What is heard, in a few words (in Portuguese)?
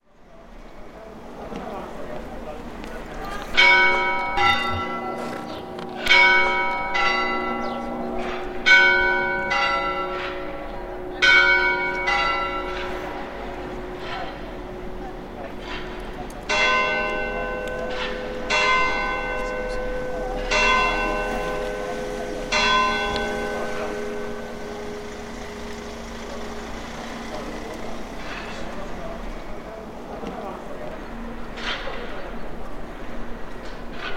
Largo da Sé, Sinos da Sé Catedral; Viseu